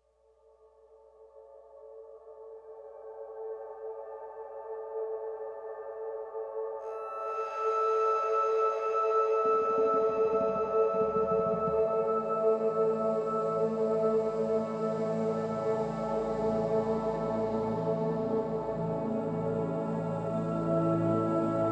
Exploration Synthy; Nora

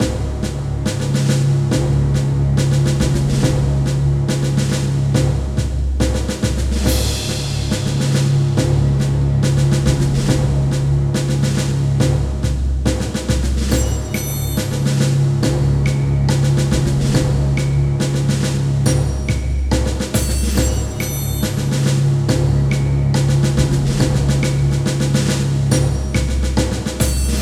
music arms; Castle Storm

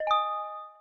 Rewarding Chime

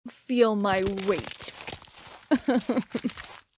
FaceStomp; Goddess of Trampling

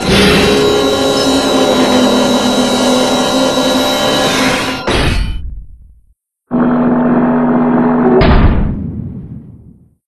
Ice Boss Start; jett rocket; wiiware wii

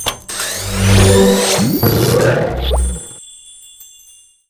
Washing Machine Teleport; raving rabbids travel in time wii gamerip 2010

